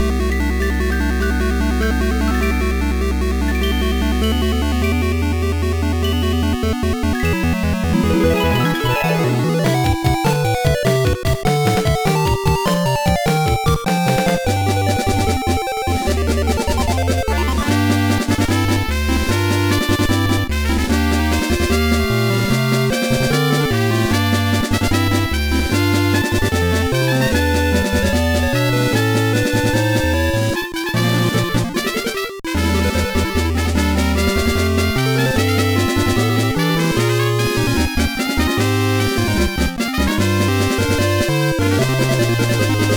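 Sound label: background music; summon night craft sword monogatari hajimari no ishi; gba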